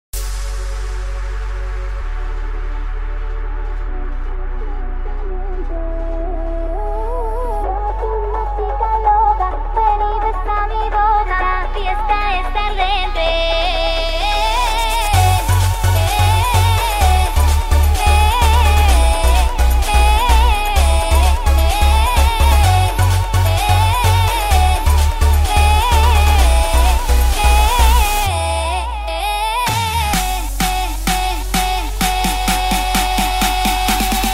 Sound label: Turkish Trap